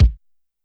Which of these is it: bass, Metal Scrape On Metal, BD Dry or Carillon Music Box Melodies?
BD Dry